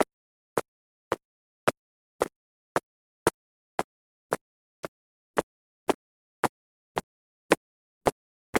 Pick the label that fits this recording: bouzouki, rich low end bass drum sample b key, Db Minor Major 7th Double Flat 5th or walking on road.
walking on road